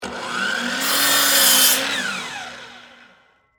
Miter Saw Cut Wood, Industry, Miter, Saw, Cut, 2X4, Wood, On, Run, Off